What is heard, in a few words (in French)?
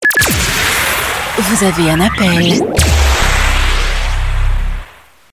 vaisseau spatial francais sonnerie